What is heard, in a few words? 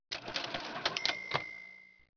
cash register